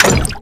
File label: imp bullet lightballistic bubbleshield